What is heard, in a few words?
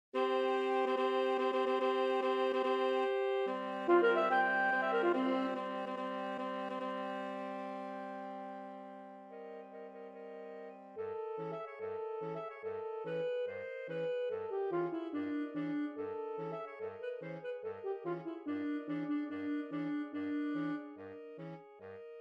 March Suite for Saxophones; Stravinsky